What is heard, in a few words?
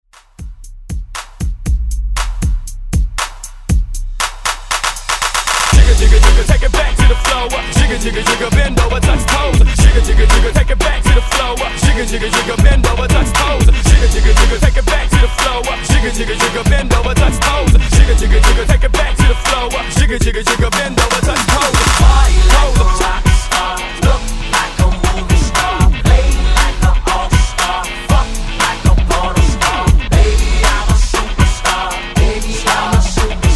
Hype Re Drum 118 Bpm; DJDOLLS